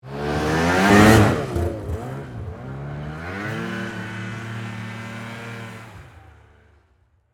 Snowmobile Jump, Snowmobile, Jump, Pass, By, Yamaha, Exciter, 440; Sports; Miscellaneous Sport; BlastWave